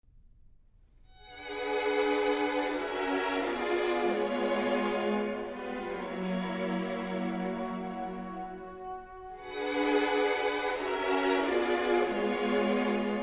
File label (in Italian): Ciaikovskij sinfonia 6 IV